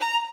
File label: solo